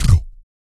Blues Soul; BOOGALOO; Spectrasonics; Vocal Planet

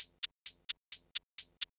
Simple Cabasa 130, Perc Loops, Old Skool House

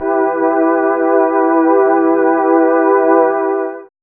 SYN SMALL, dance synth; Sound & Vision - Gigapack I CD 2 (Roland)

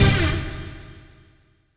RAVE, RAVESYN

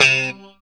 POP C 3, STRAT guitar; Spectrasonics; Hans Zimmer Guitars VOL-1; JC-STRAT POP

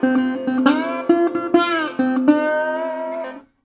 120, Blues Guitar; ILIO - Fretworks

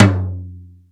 R-8 dazzling tom, Dry

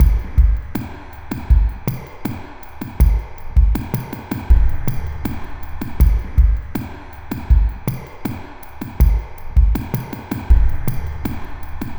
Darkwave, Processed Percussion

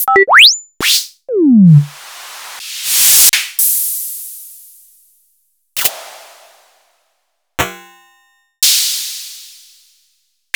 Sony DPS-F7 F7 Syn

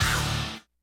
HOUSE KIT, DRUMS; Kid Nepro; Elite

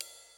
ride, Millenium MPS-400

